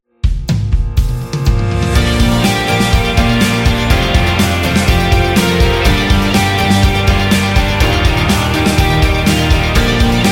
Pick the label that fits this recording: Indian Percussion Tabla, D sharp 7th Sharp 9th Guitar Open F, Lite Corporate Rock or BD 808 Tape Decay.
Lite Corporate Rock